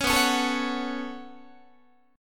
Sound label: B 7th Suspended 2nd B down Guitar; B7sus2; strummed; JGuitar